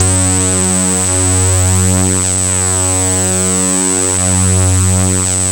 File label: F#2, Vintage Keyboards; Big Fish Audio - First Call Vintage Keyboards; OSCAR